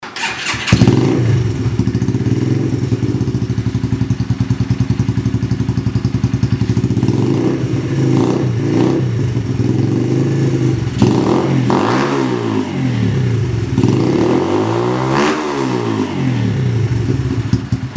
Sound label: raptor125s competition